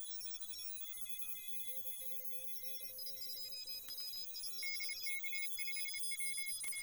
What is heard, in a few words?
3575kHz RF; HDSDR